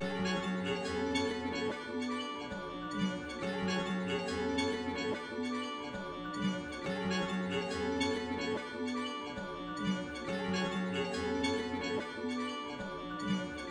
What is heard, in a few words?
PHRASE 140BPM Fm; Freyr; raevn creative soundscapes